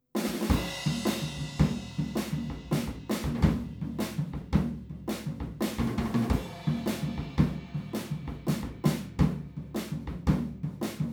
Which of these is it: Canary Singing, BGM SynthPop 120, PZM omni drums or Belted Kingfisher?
PZM omni drums